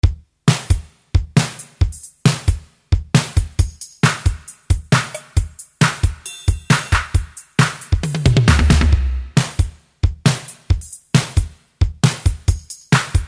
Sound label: linndrum